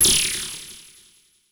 CABL TEN, CABL TENS; Total Control - Action Cutz

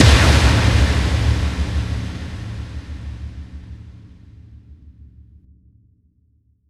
FX Kicks

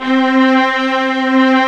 STR XSY, Str&Orch, xSynth Str, Roland; Club-50 - Foundations

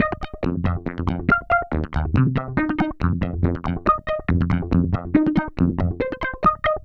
synth 140 warm; Transmission-X

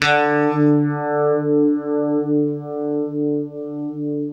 UNIVIB D 2, Guitar; East West; Steve Stevens Guitar Samples Collection

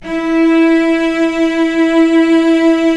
STR VC3 mf

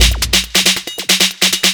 drum 138, drum-loops, 2bass, Techno

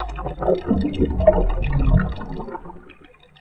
Liquid Speakers; Sonic Foundry (Sony Creative Software); Ma Ja Le Ethereal Textures

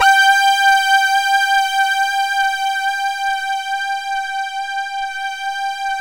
SYNTH PAD; Sound Gallery - Charged; SYNTH PAD 1

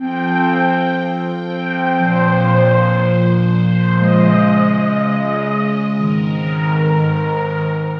Airy String; Sonic Foundry (Sony Creative Software); The Electronic Music Manuscript Collection

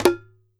MIXED DRUMS

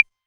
808 Clave, Novation Drumstation; Novation DrumstationV1

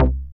BAS, Eurotech; Sounds Good

